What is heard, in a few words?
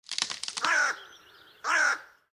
Dead Tree; HS Tree; Blind Quest - The Frost Demon